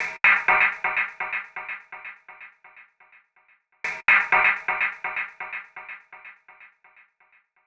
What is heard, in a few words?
Syn Fx Loop 125; Equipped Music